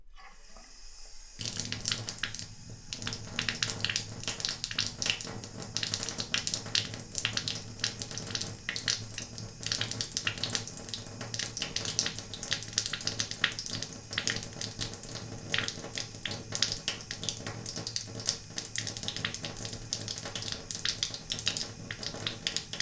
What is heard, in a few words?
bathtub; liquid; NS Studios - ultra sound collection